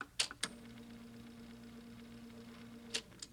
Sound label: E Typewriter On Off